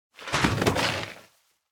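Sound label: wheelchair zusa; kurumaisu; anemoi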